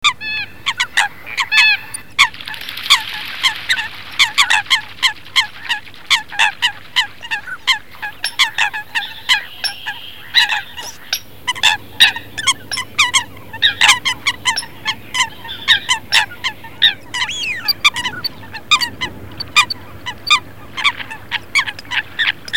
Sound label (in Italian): FOLAGA; CANTI SAG NATURE 2024